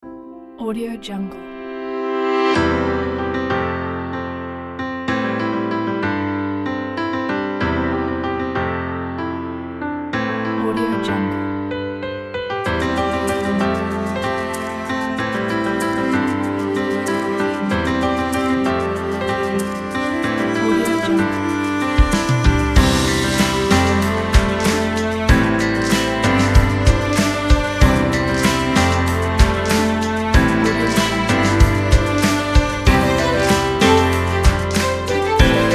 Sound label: elegant romantic pack